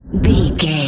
whoosh slow deep

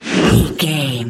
troll monster effort growl small

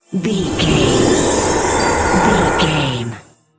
light in dark spell

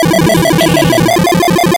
retro synth beeps fast

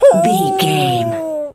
wolf hurt

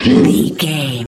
troll monster hurt pain short

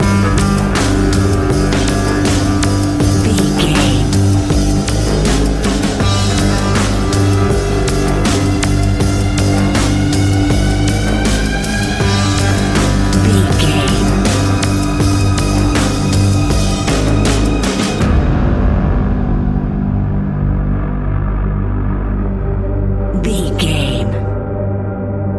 suspenseful mystery lurking

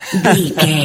voice male laugh high short

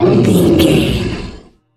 troll monster battle groan

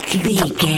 zombie voice eating chewing

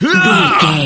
voice male attack groan

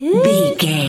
voice fun small character emote interested

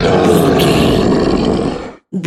troll monster battle shout

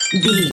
point sticker bonus score reward loop

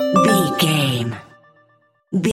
jingle chime positive

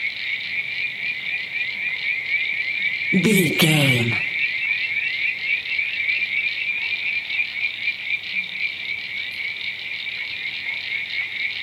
swamp night frogs crickets loop

